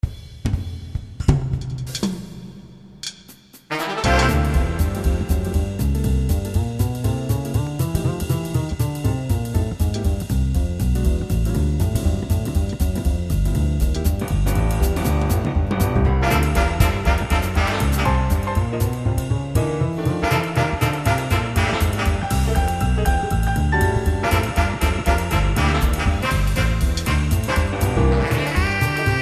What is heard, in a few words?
AMBIANCE Jazz; Idecs Music Software; Soundfont